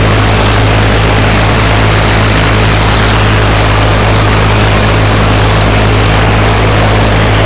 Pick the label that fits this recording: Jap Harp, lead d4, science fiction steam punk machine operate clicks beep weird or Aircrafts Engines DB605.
Aircrafts Engines DB605